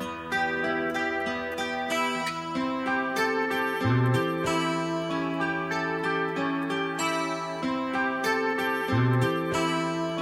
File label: guitar, Loops, Sad